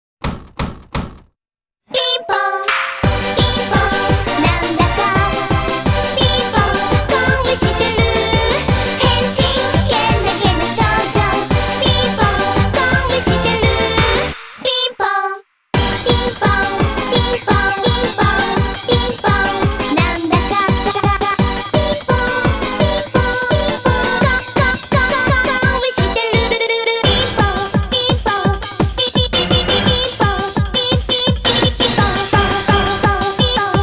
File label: ping pong loop; poppins poppins size